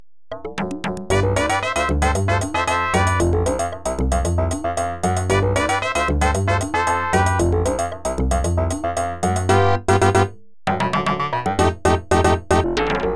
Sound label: Salsa